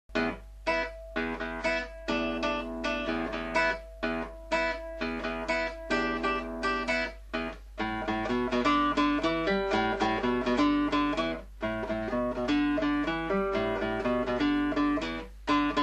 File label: Swing in triple time; Jolly Guitar